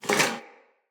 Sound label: Foley Armour Fill A; Enemy; Mockeries